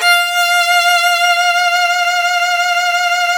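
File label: violin, Virtuoso Strings, SOLO VN, F4; ILIO; Kirk Hunter Virtuoso Strings VOL-1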